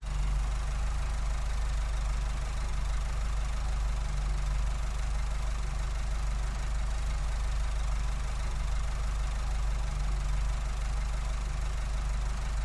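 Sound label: exterior engine idle valve cover off; detunized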